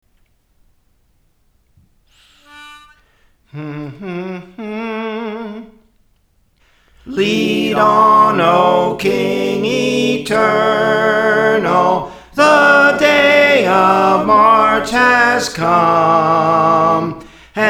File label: Soprano; Praise For The Lord Training; Lead On O King Eternal